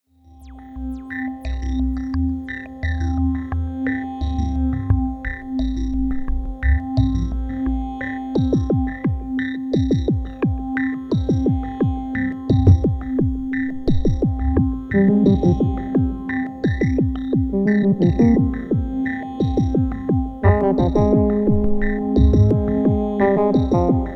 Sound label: cs60 Mix Lead